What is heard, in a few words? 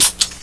mine mode, slam; weapons